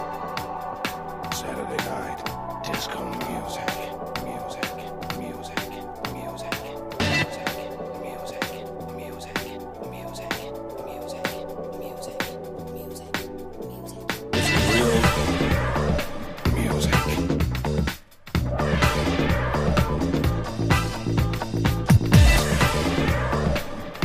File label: disco music ringtone